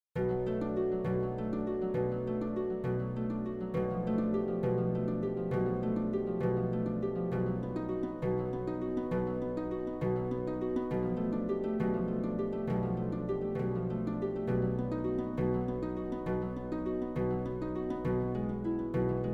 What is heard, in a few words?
Danse Macabre - Isolated Harp